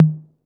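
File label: 808 mid tom; Crypton - DrumBase5000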